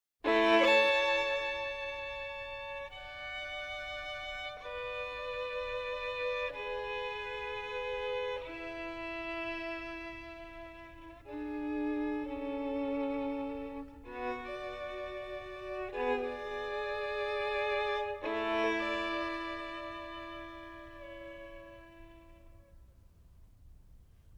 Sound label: VIOLIN